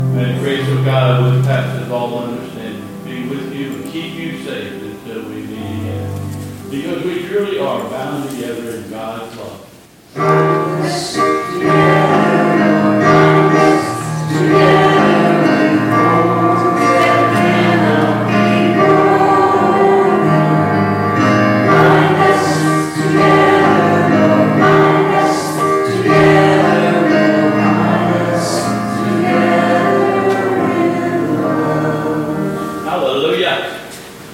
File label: Benedicton and Closing Chorus